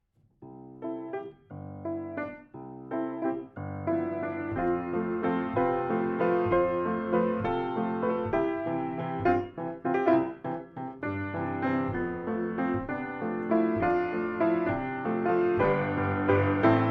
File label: Instrumental; theater